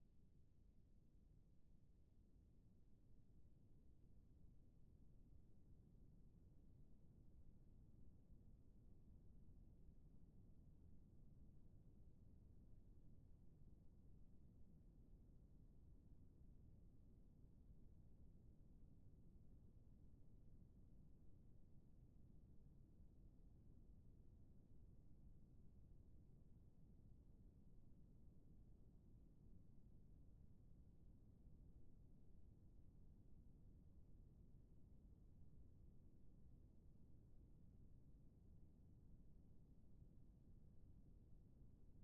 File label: bgm dynamic